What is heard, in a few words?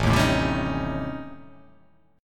A 7th Sharp 9th Flat 5th